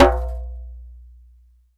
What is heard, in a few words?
DJEMBE OPSLP